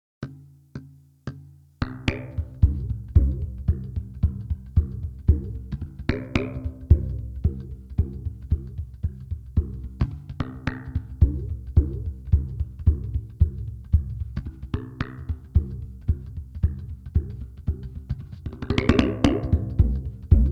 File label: korg wavedrum